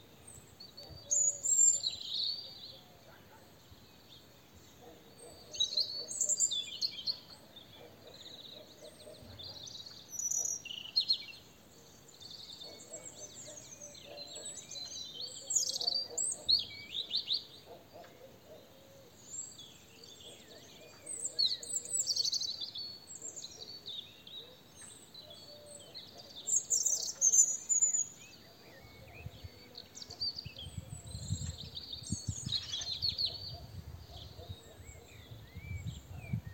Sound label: Erithacus rubecula; SETE